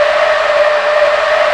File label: SKID; PC Gamer 4.2; mtm2demo